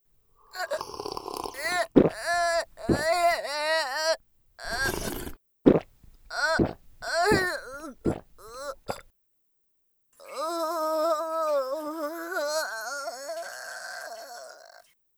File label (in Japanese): 脳吸われる; 効果音あり